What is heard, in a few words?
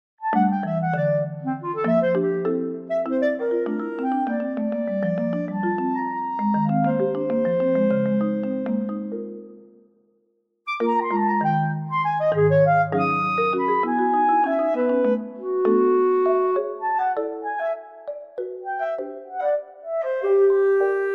clarinet